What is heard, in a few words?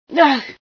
pain, female sci